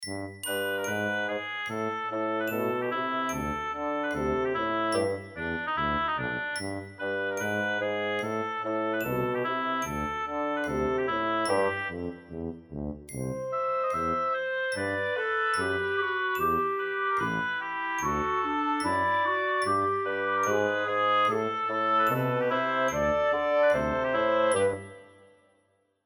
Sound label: Childrens music